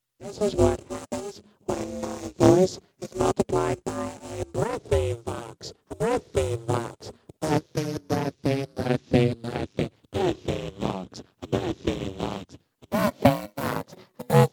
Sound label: Voice X Synth Breathy Vox; analog synth; ring modulator